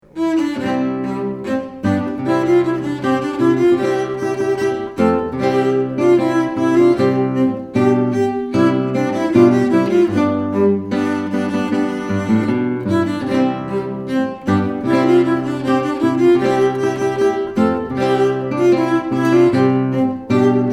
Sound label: cello and guitar; Classical Guitar; Rondeau (Masterpiece Theatre Theme)